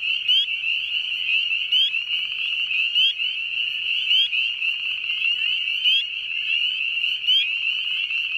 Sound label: Spring Peeper